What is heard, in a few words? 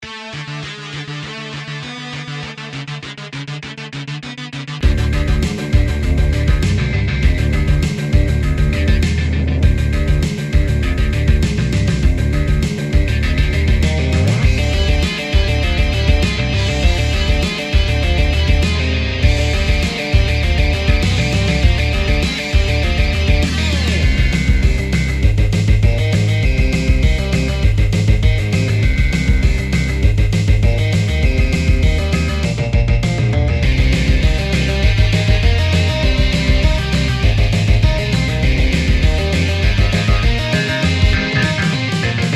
00s emo-rock style (too with silly robots)